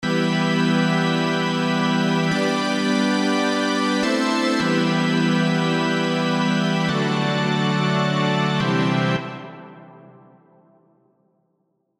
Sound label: Synth Airy Hall Chamber